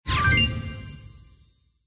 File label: payline; Crystal Furnace; Blue Purple Red